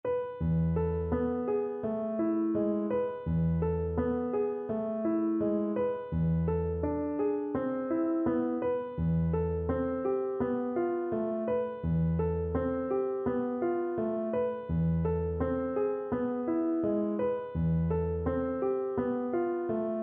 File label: nana, Viola